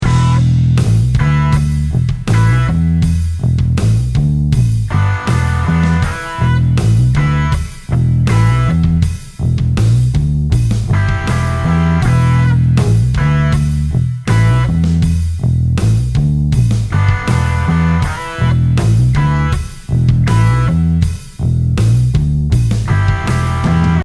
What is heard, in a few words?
Guitar